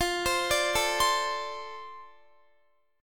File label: F 6th F down Guitar; F6 arpeggiated